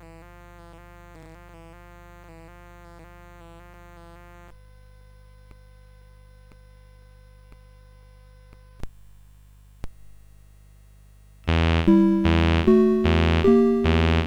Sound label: Dance of the Dewdrop Fairies, CSG8580R5